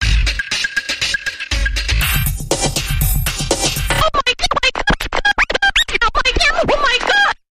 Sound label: Electronic Bumper Reversed